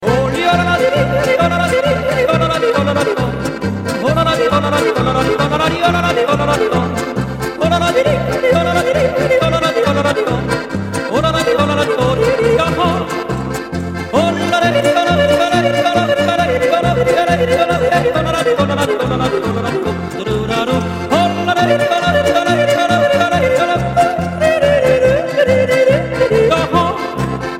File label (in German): Jodler